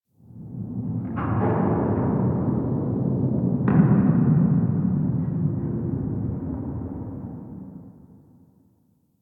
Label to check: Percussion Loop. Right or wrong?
Wrong